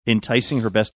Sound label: snorting quietly while talking